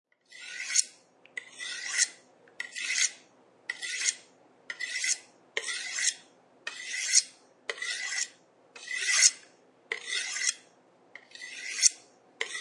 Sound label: A maniac slowly and evilly sharpens a knife.; Monsters; Horror